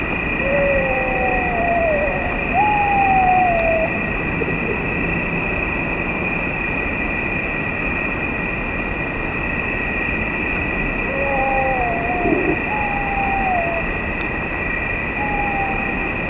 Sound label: Fea's petrel